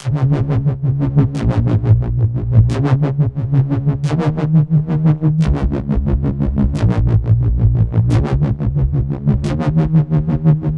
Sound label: Huming Bass, Drum n Bass; KJ Sawka; Drum n Bass & Beyond